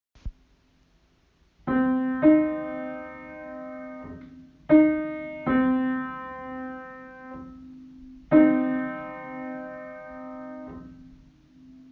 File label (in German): kleine Terz